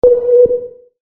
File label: ping